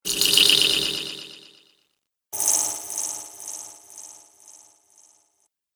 Magic Spells; Magic Sound Effects

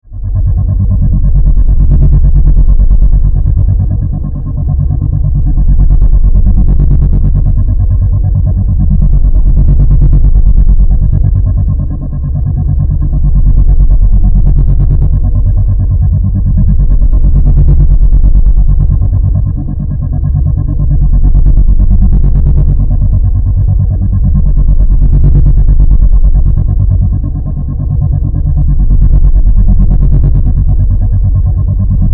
Drone, Flutter, Energy, Pulse, Scifi; 5.1

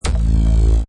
Hi Tech Panel Servo Button

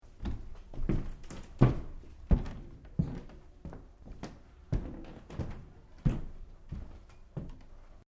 Footsteps Boots Wooden Floor Unstable Sequence; Footstep Foley; Human

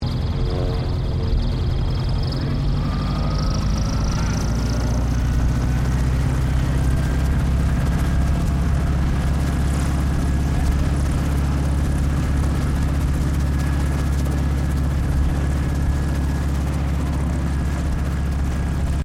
helicopter Robinson R22 low idle to high idle; Helicopters; Vehicles; Aircraft Collection

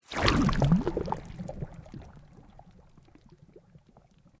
Sweep Organic Medium

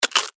Insect, Claw, Large, Snap, Movement, Bug; Insect Claws; Sound Effects:Animals:Insects; Blastwave

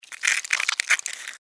Insect, Eat, Chew, Bite, Bug; Animals:Insects; Insects Eating; Blastwave

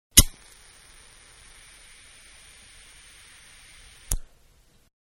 Lighter Flick And Burn, Lighter Gas; Flicks (Sound Effects:Objects); Manhattan Production Music

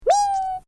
Created Creature Small Call, Alien Sound Effects; Created creatures, vocalisations and pretend speech

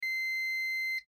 Microwave Beep, Microwave Ready Signal Beep; Appliance; Household; Blastwave

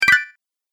Bonus Collect, video game sound effects

